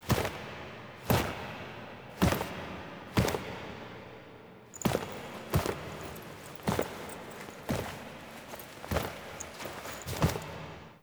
Foley A; Drifter; Warframe; Quests; The New War; Narmer Outpost; TNW Snt Chamber Archon Intro

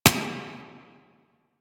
Turret Low Clip; Lasrian APC

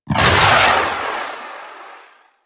Artillery Barrage Explosion Far; Weapons; Powers; Warframe; Archwing

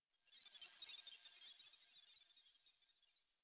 Computer Room Ambient Beeping

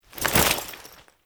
Foley Weapon Swap; Warframe; Kahl

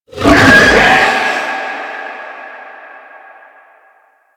Infested Juggernaut Vocal Charge; Warframe

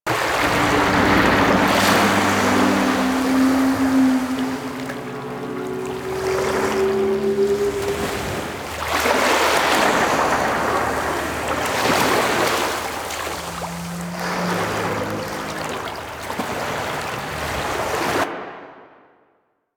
Kraken Ocean Loop; Warframe; Warframes; Pirate

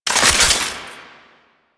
Belt Fed Rifle Reload; Warframe; Tenno; Frumentarius

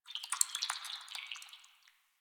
Tree Critters Scared, Ambience; Props; Warframe; Duviri

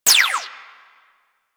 Fire Shot, Weapons; Warframe; Boar Prime

